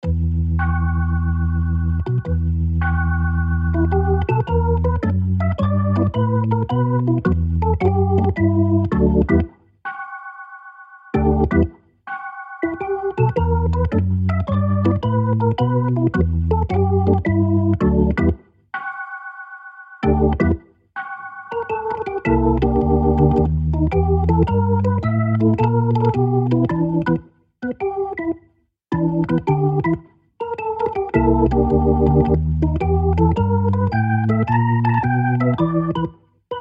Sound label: story serious